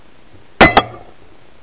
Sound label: KLIK